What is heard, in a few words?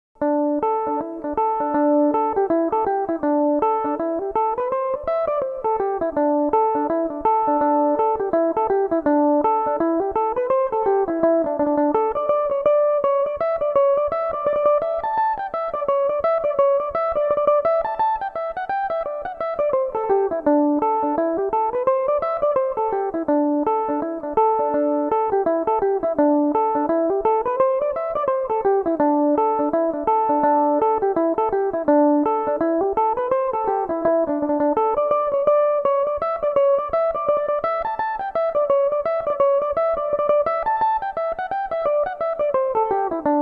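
D mixolydian, irish mandolin, tunes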